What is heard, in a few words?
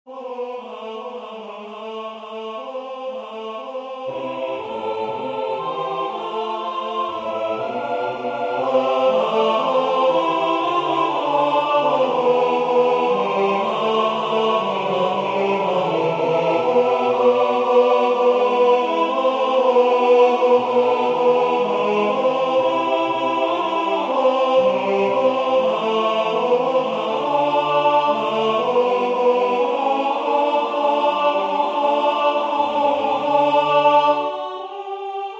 Bass solo chorus Tenor; H.Purcell